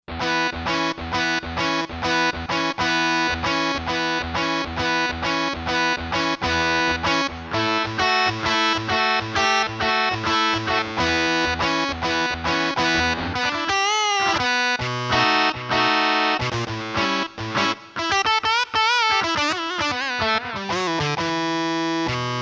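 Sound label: Feedback Guitar, Roland SC-880; Factory Tones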